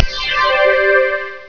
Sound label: Time Bonus; Chrysanthemum 1.01